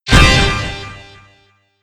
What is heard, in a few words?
Zap; SSV-Music